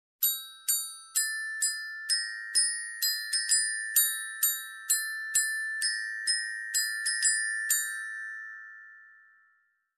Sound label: Harmonic glockenspiel melody